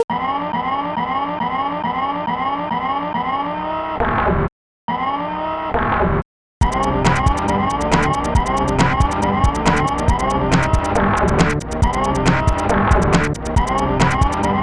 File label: Alarm; Wolverine Adamantium Rage SNES